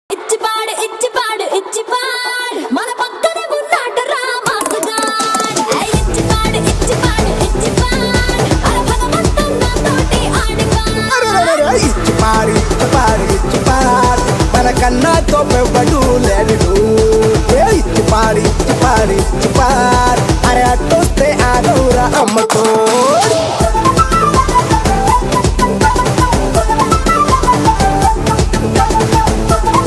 telugu ringtone; Telugu Ringtones

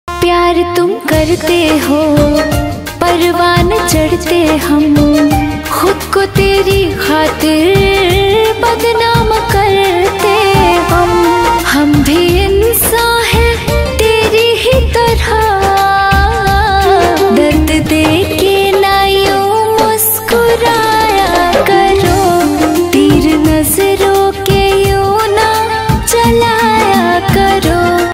bhojpuri ringtone

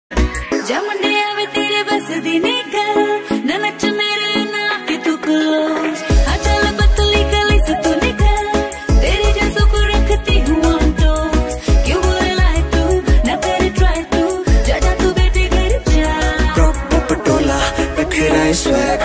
punjabi rap ringtone

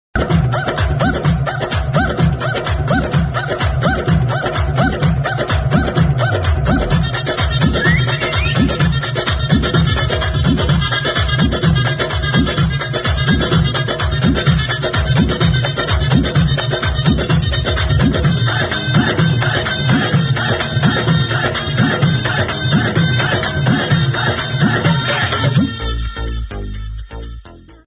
BGM ringtone

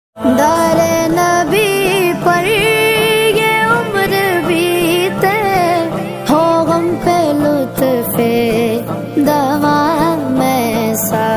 islamic ringtone

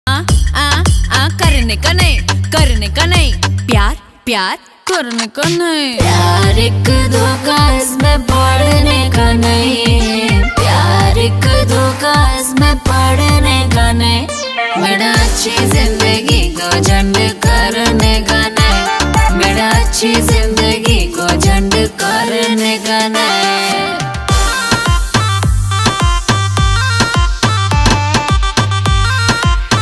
bhojpuri ringtone

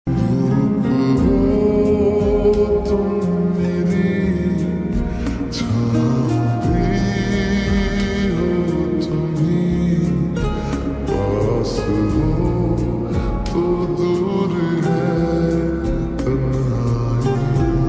slowed hindi ringtone

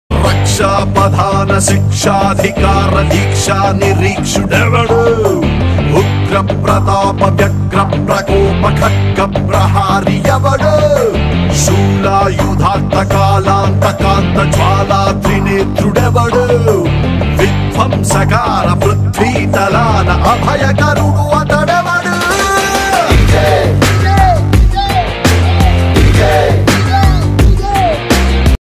tamil ringtone

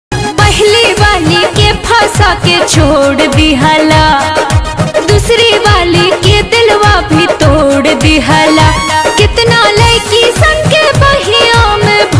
Bhojpuri ringtone

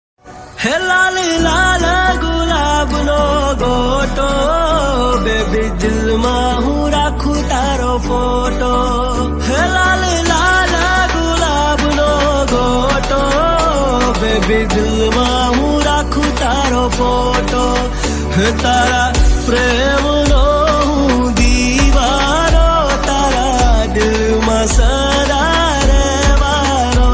Gujarati Love ringtone

